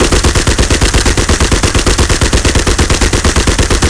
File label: Browning 303 RH Inner; Weapons; DCS